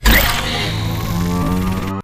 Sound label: drone target locking; Titanfall 2